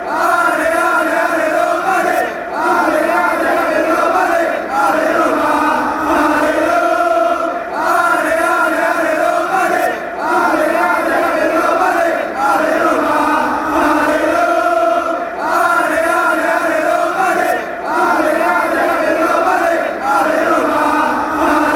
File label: ale ale ale, fan chants; roma